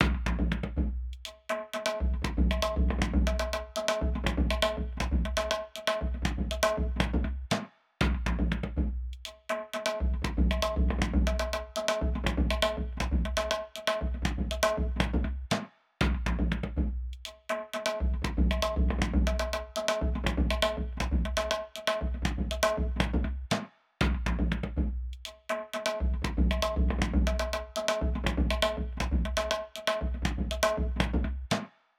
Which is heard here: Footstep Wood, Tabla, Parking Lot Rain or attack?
Tabla